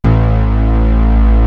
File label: DEEP